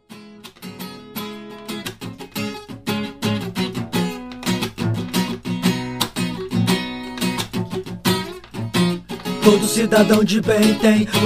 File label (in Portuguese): voz e violao